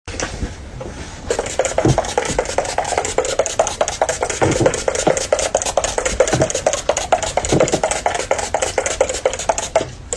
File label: Spraying; اسپری زدن اینستا طنز